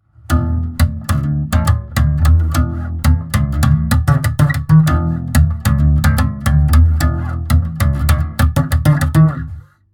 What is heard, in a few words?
slap